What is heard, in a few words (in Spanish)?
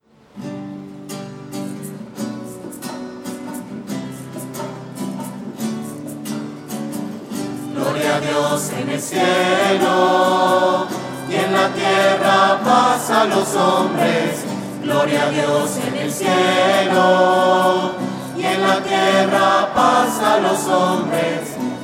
gloria voces; misas 2012